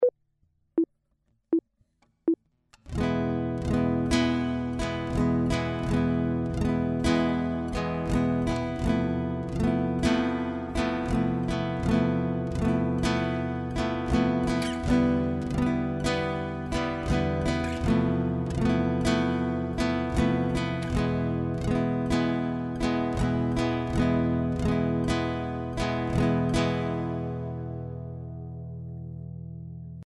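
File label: chord, guitar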